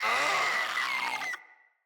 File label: Infested Runner Attack